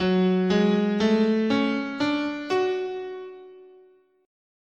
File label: F sharp Hirajoshi, scale